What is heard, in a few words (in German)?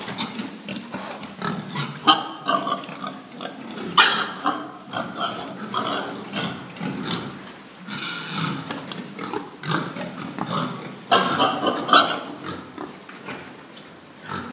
Neugierige Mastschweine